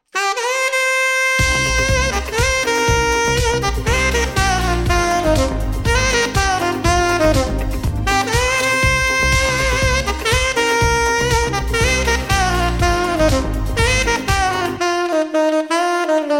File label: sax